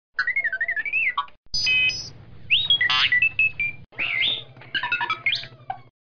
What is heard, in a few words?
R2D2; star wars